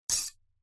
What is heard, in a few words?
CAMERA ZOOM